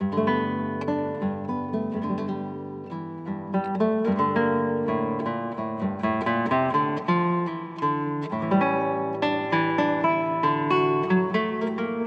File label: polyphonic